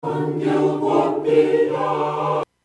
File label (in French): Hymne Vierge Ode Paque